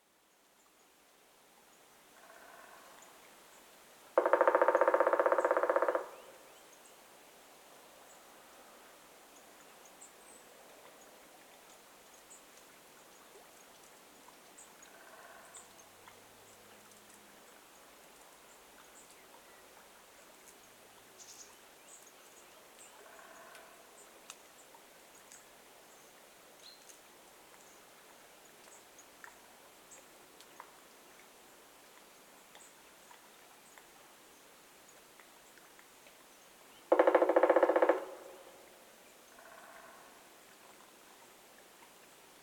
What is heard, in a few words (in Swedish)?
spillkråka; fågelljud